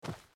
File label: land generic; out of reach treasure royale 2020 gamerip pc